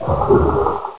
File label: ichy idle